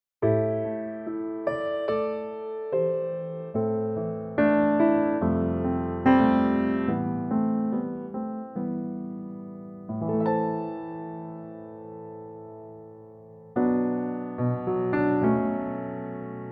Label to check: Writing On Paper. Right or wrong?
Wrong